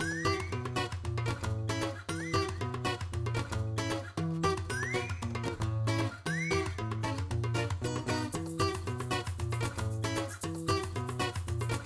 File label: Music